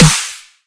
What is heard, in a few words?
Snare; Percussion